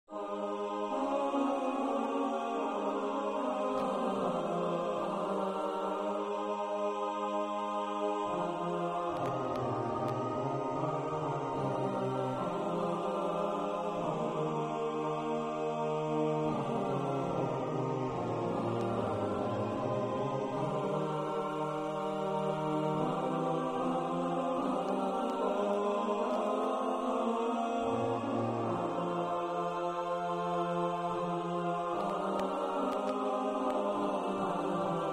choir male and female

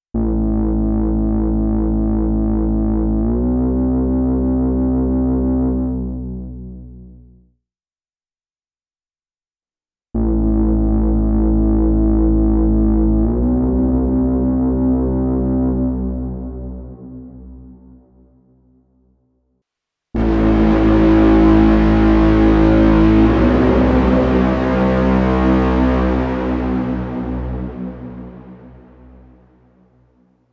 synth verb fuzzed verb

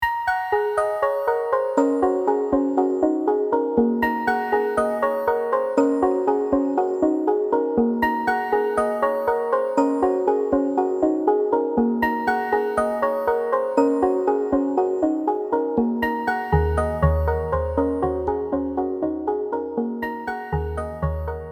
glass pennies

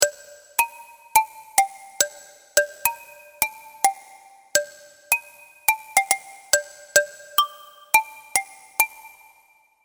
serum plucks; chiqui audio

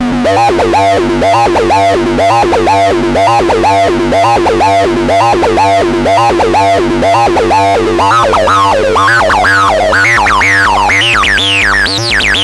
detroit techno loop

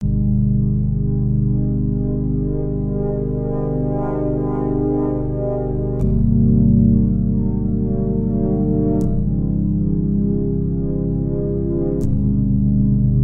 pad somber cadence

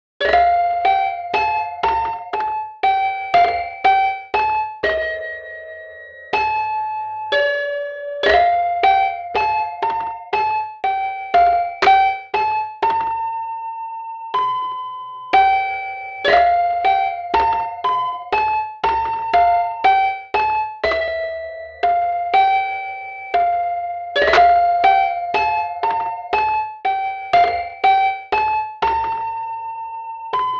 toy piano sample dry; grandpa

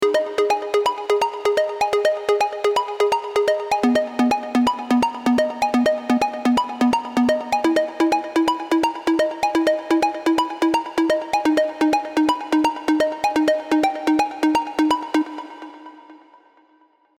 porta pluck

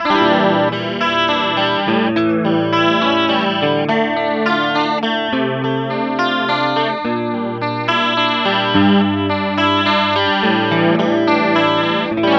pedals chorus; just pedals

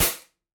shooter gun shot fire

